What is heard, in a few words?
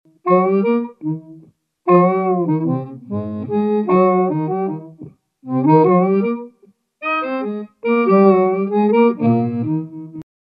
Low Octave; DigitechRP; RP200